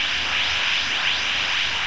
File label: void pistol black hole idle; cstrike; weapons